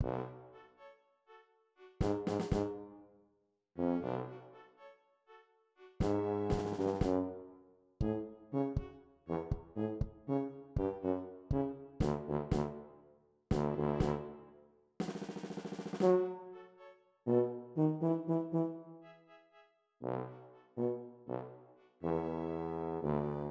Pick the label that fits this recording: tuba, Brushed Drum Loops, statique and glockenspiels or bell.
tuba